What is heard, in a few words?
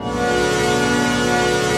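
chord pad, Pads; Best Service; XX Large - Pads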